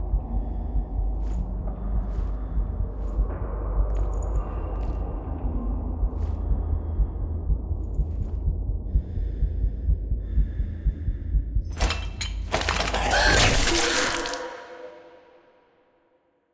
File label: chomp